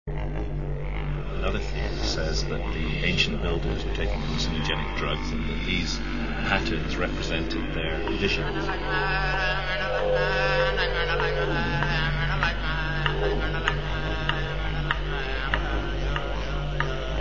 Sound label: drum